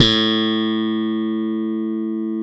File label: POP BASS, Electric Basses